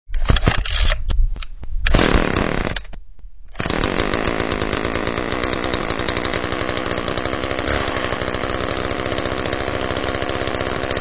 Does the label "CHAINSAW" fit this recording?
Yes